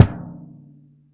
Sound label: BD, Bassdrums, Roland TD-20